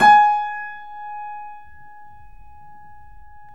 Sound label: PIA GRND, Roland; Sound & Vision; Gigapack I CD 2; PIA GRAND 1